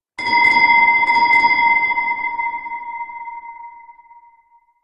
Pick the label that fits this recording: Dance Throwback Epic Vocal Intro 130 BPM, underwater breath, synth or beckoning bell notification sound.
beckoning bell notification sound